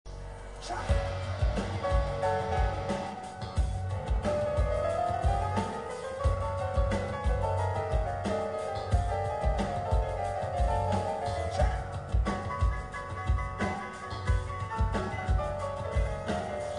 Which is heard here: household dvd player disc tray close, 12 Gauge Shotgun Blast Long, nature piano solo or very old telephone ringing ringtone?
nature piano solo